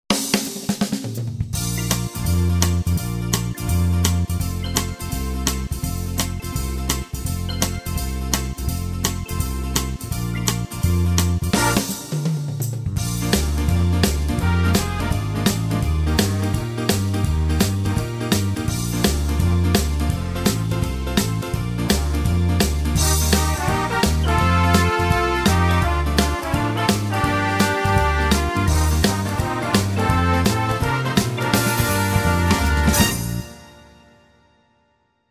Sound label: Real Foxtrott; wersi